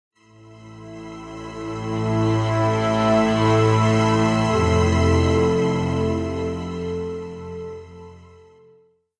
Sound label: Industrial Foreboding; Civilization Revolution; Xbox